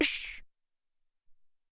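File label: Vox Snare, Alesis SR-18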